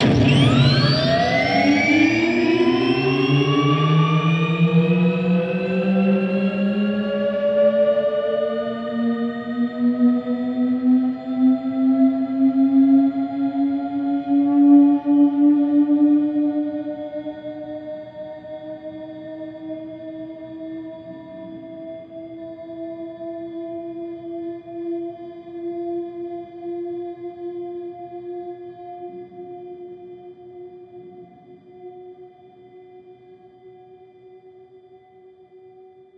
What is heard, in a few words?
jet